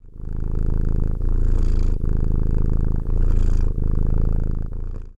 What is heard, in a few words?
cat purr